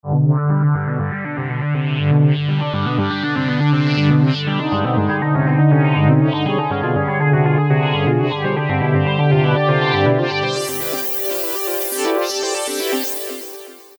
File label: CPU Talk, Korg MS2000; Factory Patches